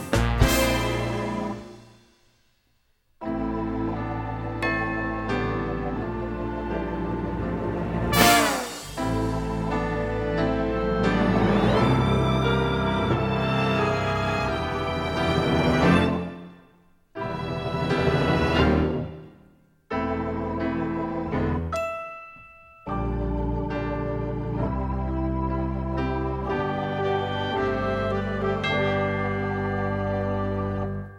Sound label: Accompaniment